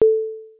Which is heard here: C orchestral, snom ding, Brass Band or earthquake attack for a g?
snom ding